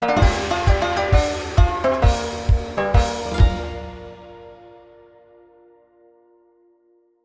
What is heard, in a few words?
freespin Outro; netent